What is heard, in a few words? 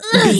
voice female effort push